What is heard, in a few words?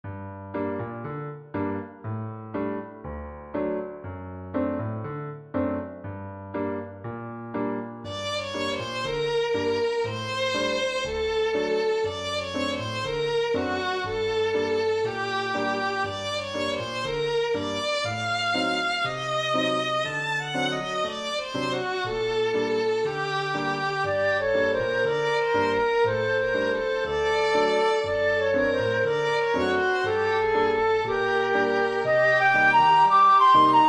Heat Trio